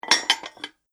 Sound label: Medium Sized Dinner Plates Stacking Noise; Dishware; Household Sound Effect